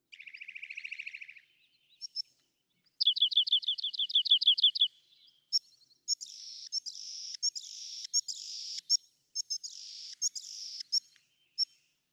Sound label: Rock Wren